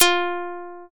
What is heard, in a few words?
shamisen